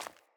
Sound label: Sandals Run Default, Footsteps